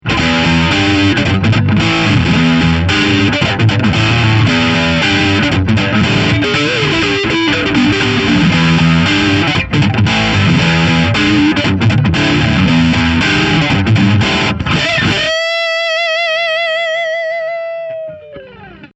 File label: VOX AC100 Heavy Crunch